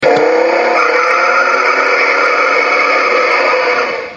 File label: Wheelspin; Dodge